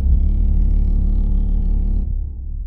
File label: low bass C